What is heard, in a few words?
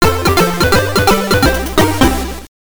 Death